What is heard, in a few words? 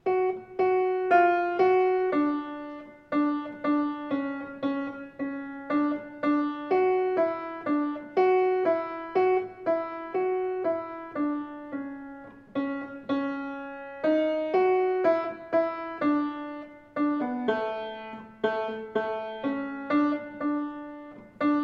StVincent ALTO